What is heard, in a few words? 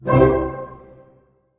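C major pianissimo, orchestra hits; Sound On Sound - Peter Siedleczek's Orchestra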